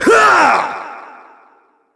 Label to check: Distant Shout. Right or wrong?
Right